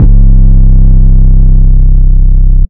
stop breathing 808; drumkits; fl studio; slayerx drumkit

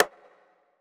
Rim Dirty Stick; drumkits; Percs; fl studio; young kico drumkit

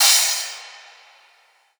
Crash; Cymbals

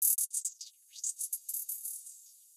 hh roll fx; drumkits; fl studio; goodtakimu drumkit

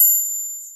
triangle; fl studio; xander brown drumkit

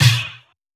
snare; goodtakimu drumkit; fl studio; drumkits

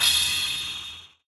crash, Cymbals; goodtakimu drumkit; fl studio; drumkits

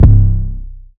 stop 808; drumkits; fl studio; slayerx drumkit

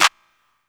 Clap; FL Studio; young kico drumkit; Chronicles Of The Atlantic Trap (Sound Kit)

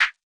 Snare; fl studio; drumkits; dstarkels plugnb

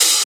Open Hat, Cymbals

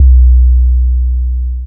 808 Low Notes; drumkits; 808s; fl studio; young kico drumkit; Chronicles Of The Atlantic Trap (Sound Kit)

